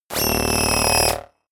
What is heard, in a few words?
screaming synth sequence short